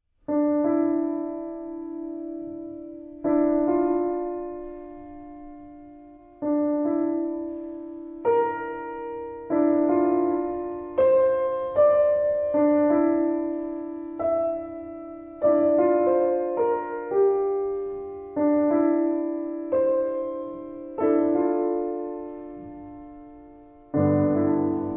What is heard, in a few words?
Prelude